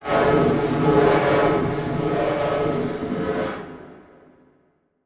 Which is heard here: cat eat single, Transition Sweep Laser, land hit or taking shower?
Transition Sweep Laser